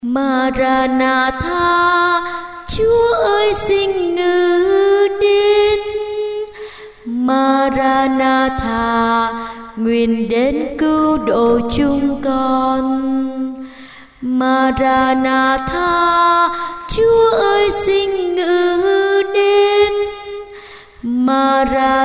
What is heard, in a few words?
basso